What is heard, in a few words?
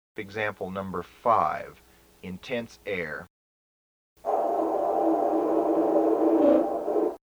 Emb TUBA